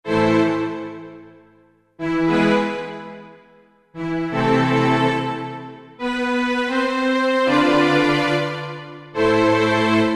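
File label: Strings Section; Roland SC-880; Factory Patches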